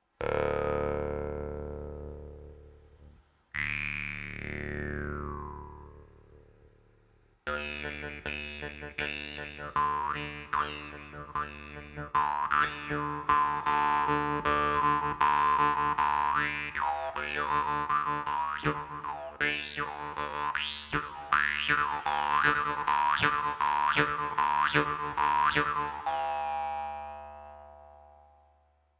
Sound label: jawharp